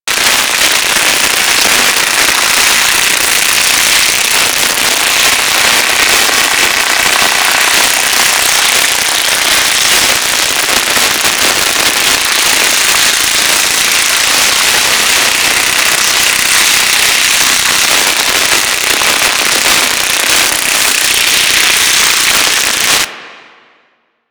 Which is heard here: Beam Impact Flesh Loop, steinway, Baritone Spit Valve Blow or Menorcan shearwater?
Beam Impact Flesh Loop